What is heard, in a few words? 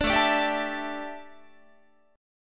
A 7th Suspended 4th, A, down, Guitar